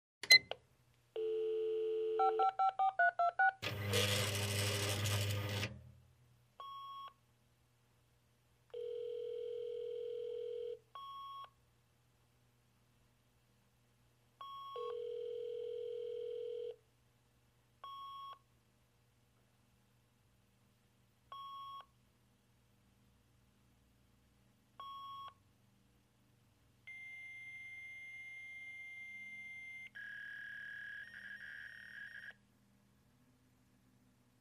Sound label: Fax Sequence